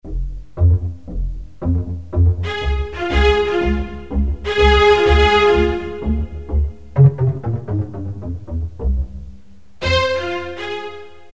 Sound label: Strings